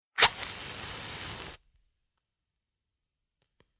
Fire Three Matches Struck Together Fast Single Strike Large Flame Burn; Flame Sound Effects